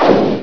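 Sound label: EXPLODE, Explosions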